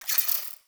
Rail Jack Tech One Off

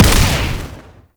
Shield And Sword Explosion; Warframe; Grineer; Mortifor

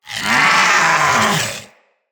Vocal Death, Hunting Critters; Characters; Vulpaphyla

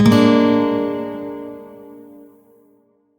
Shawzin Min Chord L; Warframe; Lotus Shawzin

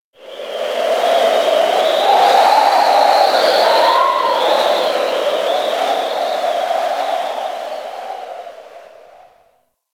Ice Cold Wind Whistle; Warframe; Corpus Ice Planet

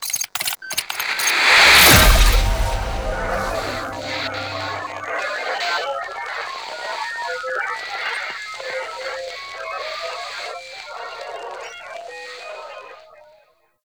Title Card End; Warframe; NineteenNinetyNine

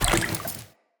Walk Water, Footsteps; Characters; Enemy; LadyDeora

